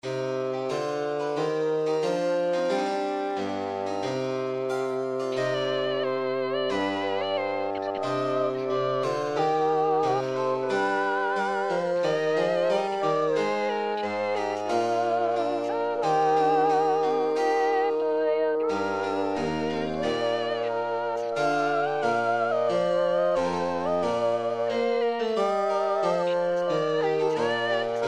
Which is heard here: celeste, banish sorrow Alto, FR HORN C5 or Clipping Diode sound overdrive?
banish sorrow Alto